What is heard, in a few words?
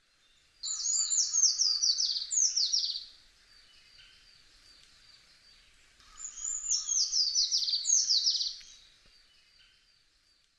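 Eurasian treecreeper; kibashiri; yaita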